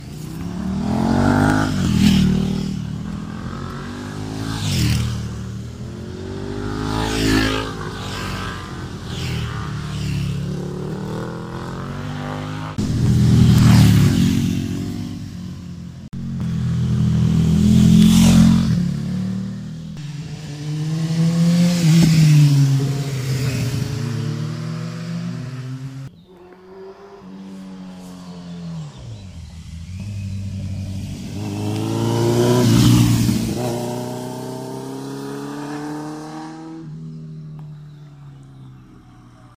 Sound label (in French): Bruits de sentier